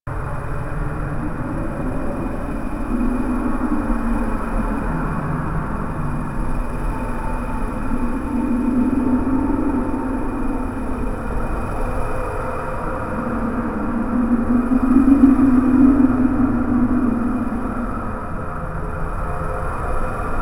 Challenge Amb B, Ambience; Warframe; Tenno

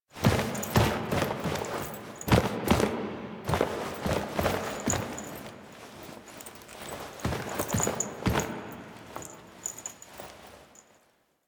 Foley; Drifter; Warframe; Quests; The New War; Narmer Outpost; Chamber Ceremony End

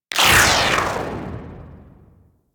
TnT Tail; DE; Warframe; Weapons; Archwing; Powers

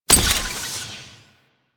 Glyph Prism Hit; Warframe; Duviri; Glyph Puzzle

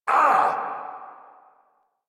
Pain Lich A

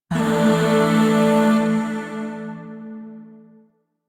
Shawzin Pent Maj Chord C; Music; Chords; Warframe; Zariman Void Shawzin; DE

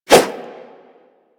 Nunchaku Whoosh; Warframe; Tenno

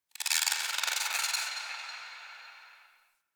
Ext Creature Distant High; Ambience; Misc Outdoor; Warframe; Infested Eris

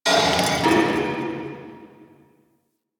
Asteroid Close Metal Hits; Ambience; Warframe; Grineer Asteroid

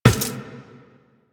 Run Glass, Footsteps; Warframe; Tenno

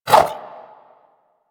Inf Throwing Star Whoosh, Infested; Warframe; Weapons

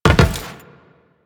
Land Metal, Footsteps; Warframe; Teshin

